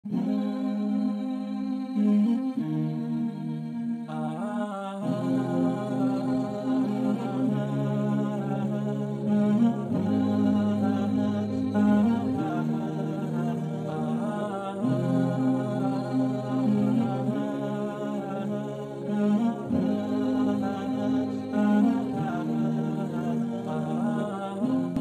islamic ringtone without music arabian ringtone islamic background ringtone